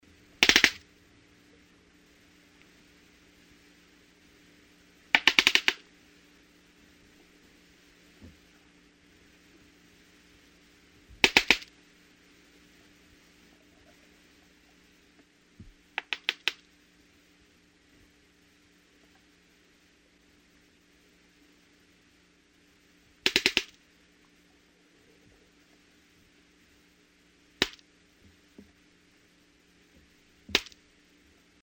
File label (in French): cachalot